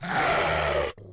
growl; CosmicRage

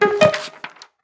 mail open

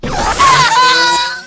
zap; CosmicRage

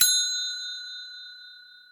movement; general comms

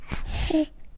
baby yawn; CosmicRage

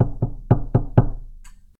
door tap; CosmicRage; doors

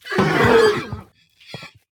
die, horse; combat; creatures

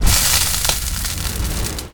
bzzt; ships combat component; CosmicRage

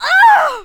die, combat creatures default she; CosmicRageSounds

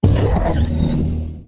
attack, cylarkan she; combat; creatures; CosmicRage

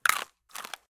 cure eat; CosmicRage

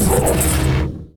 attack, cylarkan she; combat; creatures; CosmicRage

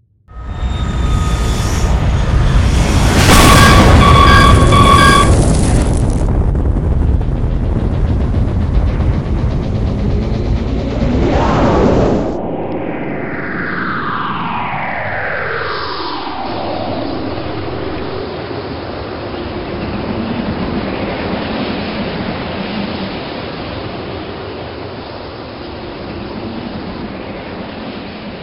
escape

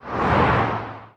car, highway old car; car1; CosmicRage; CosmicRageSounds

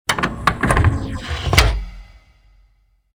installing, ships; Cosmic Rage; ships misc

